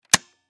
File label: Nerf Pistol Shoot, Foley, Pistol, Shoot, Dart, Fire, Toy, Gun; Toy Guns